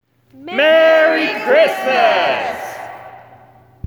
Audience "Merry Xmas"

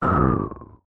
Monster Attack

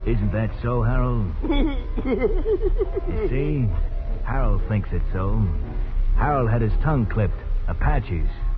Tongue Clipped

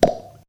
Plopp

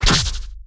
Energy Short Sword

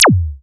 Zap

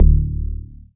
Bass

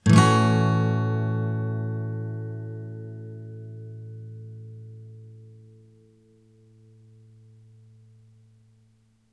Yamaha A6

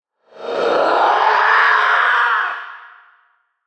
Raging Madman Zombie Beast Reverse Reverb

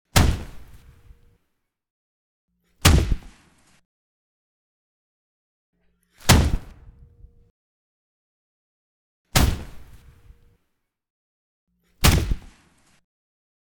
Body Fall And Crash; Custom Tmnt 2012 Style

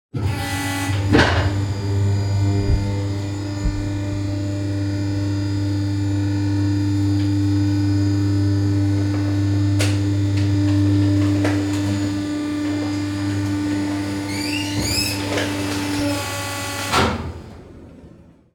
Compressed Waist Rubbish Container

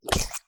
Squished

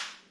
Slapping

